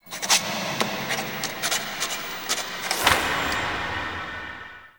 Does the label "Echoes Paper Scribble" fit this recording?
Yes